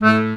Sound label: ACCORD A1, Accordions; USB Soundscan vol.40 - Complete Accordions; AKAI